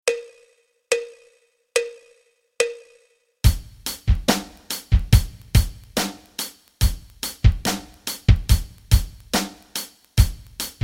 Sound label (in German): rock rhythmen